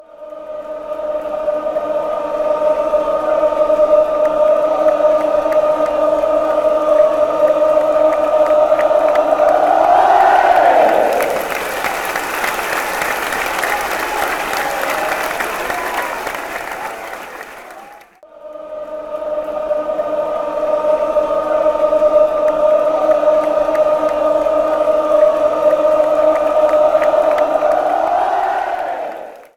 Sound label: ohey, chants